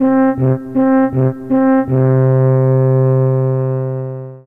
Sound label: horn, horn signals; wesnoth